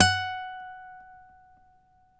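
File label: nylon, FLAMENCO, Guitars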